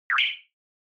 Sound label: Short Accent High; ショートアクセント